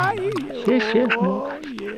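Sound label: Music Village Calm Inside; from dust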